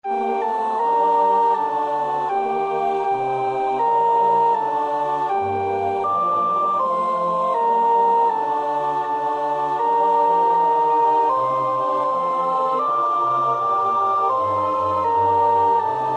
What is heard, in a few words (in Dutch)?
sopraan; BWV 040; Bach (Johann Sebastiaan)